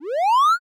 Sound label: reverse